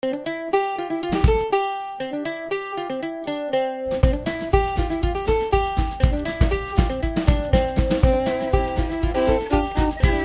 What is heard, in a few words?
Country Hoe down